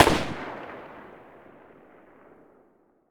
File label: saiga muzzle mono far, weapons